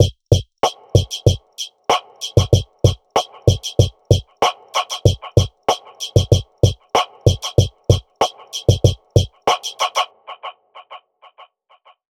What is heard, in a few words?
loop mixed